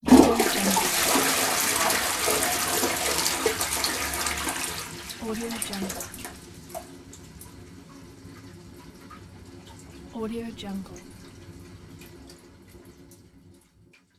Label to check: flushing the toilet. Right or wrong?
Right